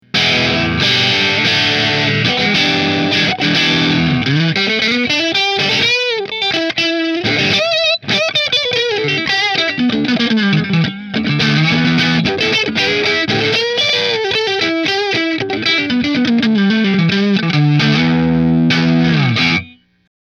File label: Nola Model 8 Middle Marshall, guitars; New Orleans Guitars Model-8 Sunburst